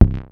Bass Drum, Cwejman S1 MK2, Drum Machines; 300 Drum Machines

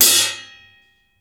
RIDE CHOK, RIDES; Sampleheads; New York City Drumworks VOL-1; SP RIDES

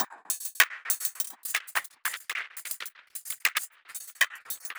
perc 100 slipping hats, Percussive Loops 100 bpm; Sample Magic - Transmission-X

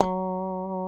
F#3 FUNK SFT, GUITARS, FUNK STRAT; Spectrasonics; Hans Zimmer Guitars VOL-1

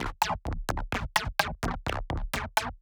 perc fathoms fm burps; Polyend; Recue; percs glitches and layers

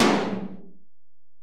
Tom, Alesis DM-PRO, Drum Machines, Toms

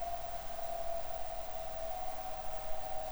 Processed Noise

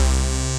SYN C, BUZZ SYNER

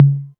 808 Tom Mid, Drum Machines; DrumstationV1

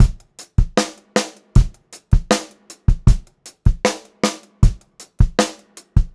78 STR BEAT, Funk-Groove Drumloops; USB Soundscan vol.38; AKAI

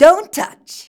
VOX, Singin

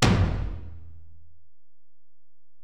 Tom, Alesis DM-PRO

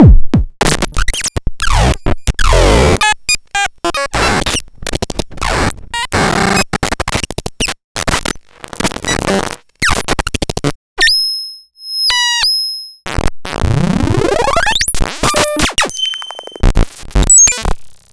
Cwejman S1

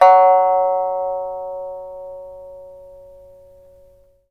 PIPA F#4 P; Spectrasonics; Heart of Asia

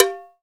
COWBELL MPC

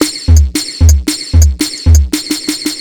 Beat, Futurist Drum & Bass; Drums & Percussion

